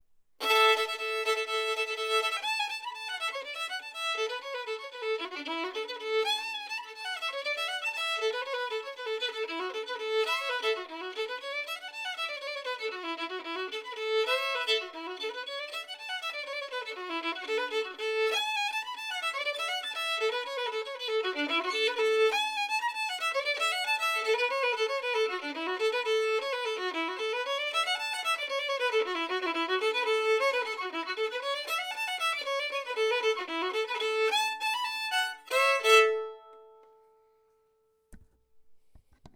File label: fiddle; Cripple creek